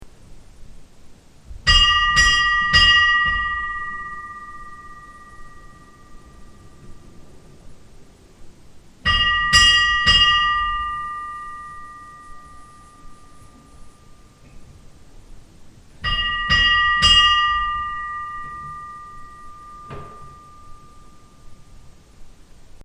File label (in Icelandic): hjardarholskirkja litla, kirkjuklukkur